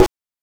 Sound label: GHOST SD; NES DPCM; MIDINES